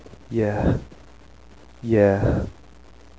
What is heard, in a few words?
yeeh